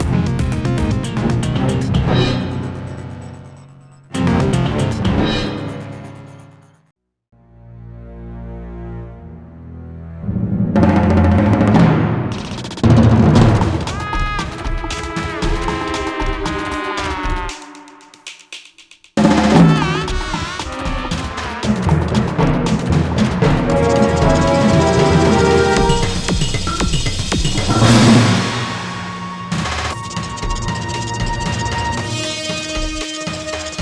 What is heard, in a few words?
first fight music; Ben 10 Protector of Earth; Wii